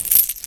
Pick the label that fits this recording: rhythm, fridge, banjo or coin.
coin